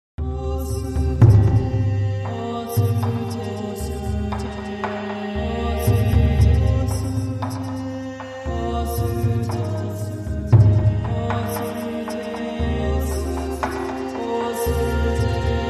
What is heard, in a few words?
Celts And Drums